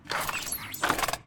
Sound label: reload